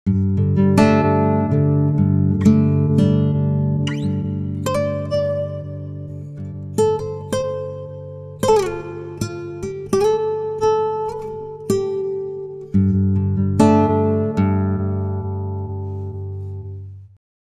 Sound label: pitchshift guitar; ikm sample tank ps ts